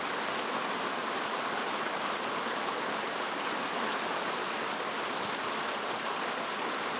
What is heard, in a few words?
Heavy rain; zps; Ambient